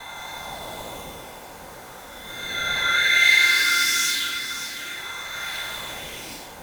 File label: pad, atmo pads; Best Service; XX Large